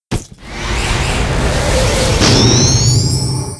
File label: Mob raid die; Monster; 레이드; 화화공자조원석